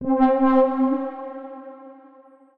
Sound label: LEAD Synth Horn (C); One Shots; Cymatics; Mystery Pack Vol 4